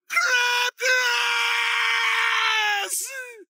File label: screaming crabgrass; hugh neutron